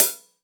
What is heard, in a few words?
Open Hihat; Vengeance Samples; VEE Cymbals